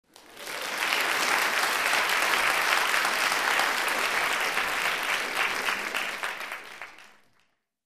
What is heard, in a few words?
Applause in the theater; standing ovation